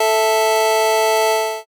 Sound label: organ